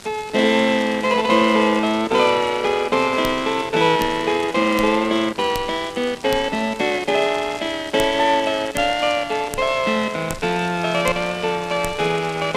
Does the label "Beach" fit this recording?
No